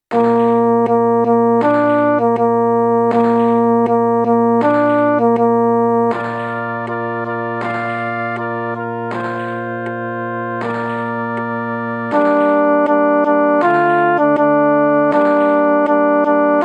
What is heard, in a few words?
midi